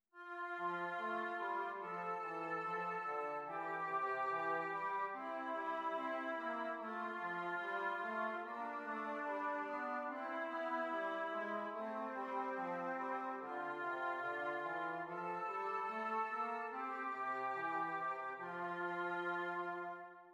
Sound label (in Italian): esercizi di contrappunto semplice A due voci; 4 gattung; Ronge